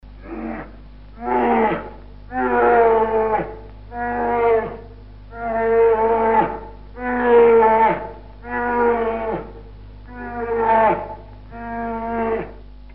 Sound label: grizzly bear